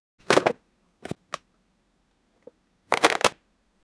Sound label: luxurious unboxing sounds; box opening